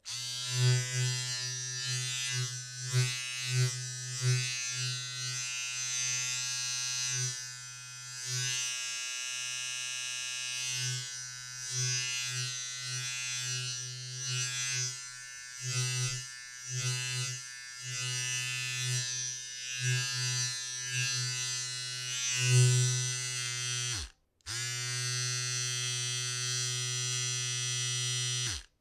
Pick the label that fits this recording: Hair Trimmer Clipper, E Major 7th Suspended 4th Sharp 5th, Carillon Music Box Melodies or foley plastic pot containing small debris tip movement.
Hair Trimmer Clipper